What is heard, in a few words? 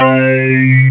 Click Owww